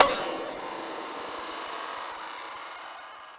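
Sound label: snare drum, drum & bass, 150 BPM; Ueberschall; Drum & Bass Resonance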